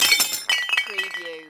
CARTOON SHORT CRASH

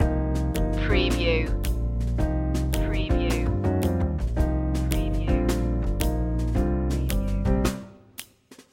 lady swing loop

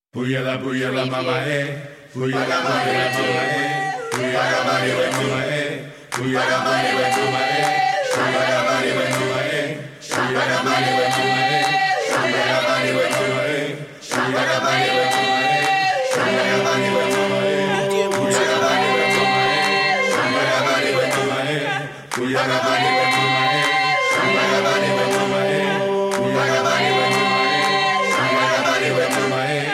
african acappella

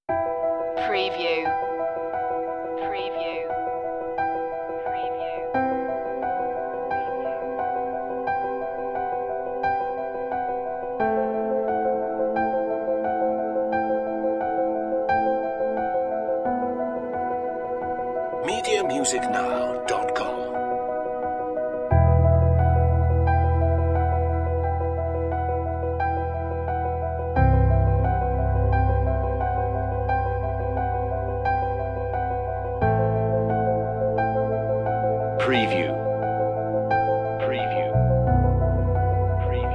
time lapse no drums no perc

